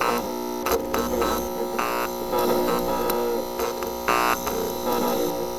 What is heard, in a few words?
loop light broken; Doom